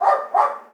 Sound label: dog barking; Golden Crayon Sounds